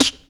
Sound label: Human Perc, Body Perc